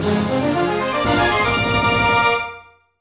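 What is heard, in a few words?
Fanfare Get Heart Container, gameplay sounds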